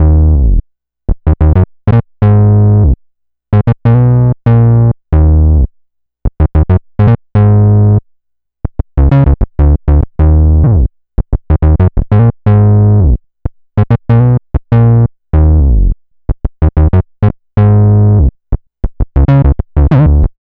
Synth loop 94 Ebm, Synth Bass Loops; Slate Digital BASSHOLE Sample Pack

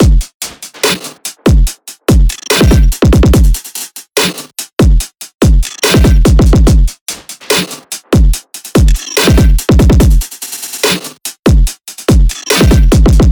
drums 144, Full Drum Loops; Slate; SEISMIC Drum Sample Pack

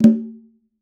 conga Single Hit Flams; Raw Perc One Shots; Slate; SD SEISMIC Drum Sample Pack

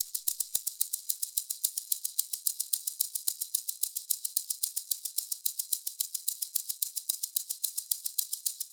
maracas loop, 110; perc construction loops; SEISMIC Drum Sample Pack; Slate

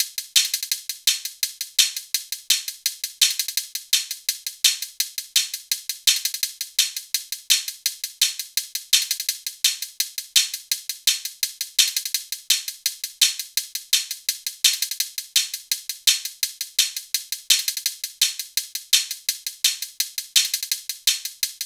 hat 84, Drum Loops, Hat Loops; Slate; MIDNIGHT Sample Pack

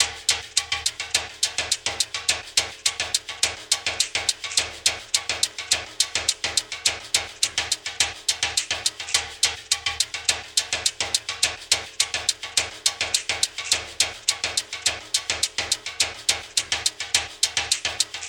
perc 105; Drum Sample Pack; Loops; SD SEISMIC; Slate

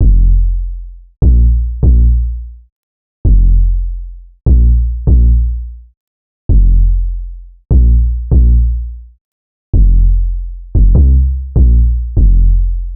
808 loop 148 F; Slate Digital BASSHOLE Sample Pack

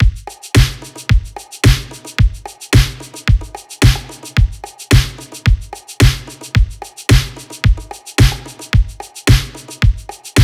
drums 110, Drum Loops, Full Drums; Triassic; Slate; MIDNIGHT Sample Pack

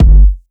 Kick Monster, 808 Kicks; Slate; Vintage Drum Machines Volume 2 808 Edition

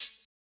cymbal quick; Hip-Hop; Drum One Shots; SD CAICOS Hip-Hop Sample Pack; Slate

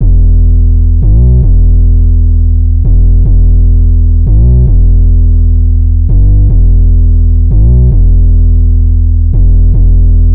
808 loop 148 Em; Slate Digital BASSHOLE Sample Pack

